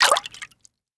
Bird Exit Water; angry birds rio

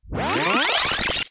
Fastforward; pangya fantasy golf pc

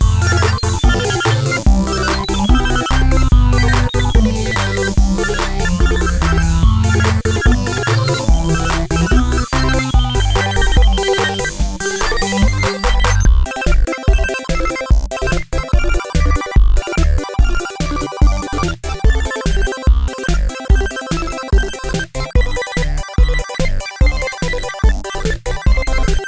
danger funny; Identity V

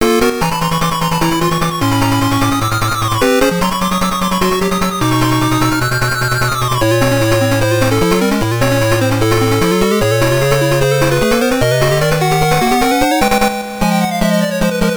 Fall of Footsteps; Shining Force Gaiden Final Conflict GG game rip

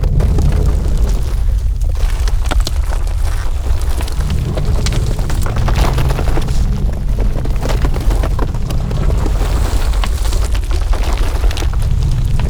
rock column

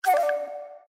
Print complete; Windows 7; Calligraphy

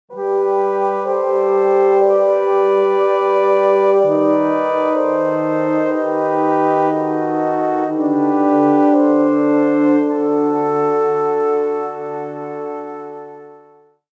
Medieval Calm; Civilization Revolution

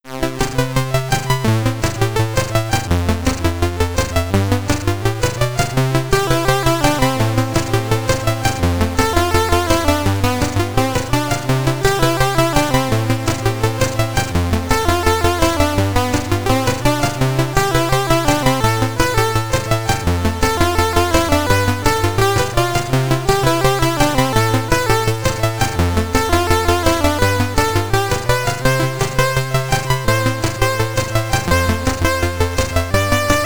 High Score (SID Stereo); Solomon's Key; Commodore 64

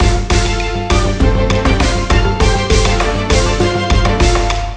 Battle Win; Meow Country Story; Android

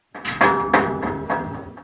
Metal Bars Random; The Town

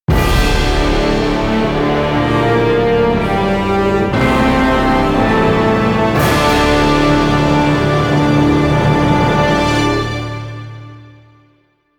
Music Mission complete; The Incredible Hulk; PlayStation 2

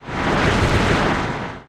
Bonus Explode; 7 Wonders of the Ancient World

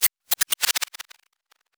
rewind

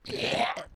Monster Strain

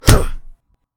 Punch

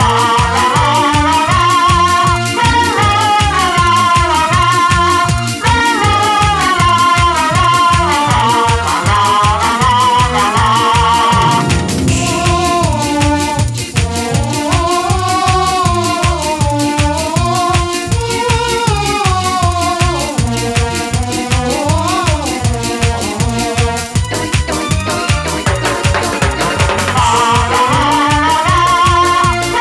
Music Winning; Crash Tag Team Racing; Tomb Town1

